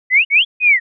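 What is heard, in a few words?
bird, Bird Chirp, Nature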